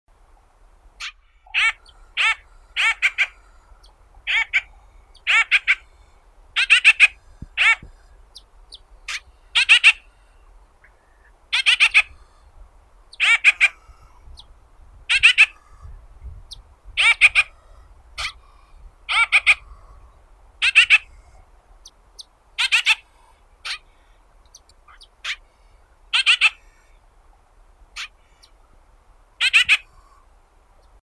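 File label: grosbeak, Mycerobas carnipes; dubonos archovii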